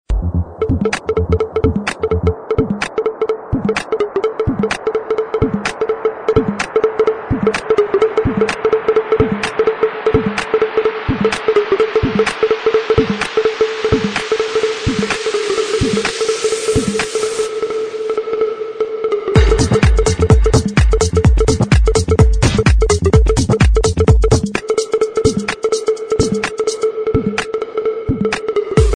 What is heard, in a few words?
club sound ringtone